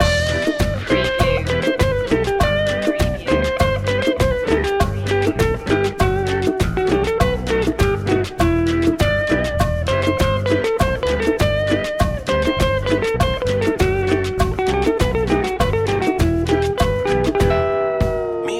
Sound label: latin loop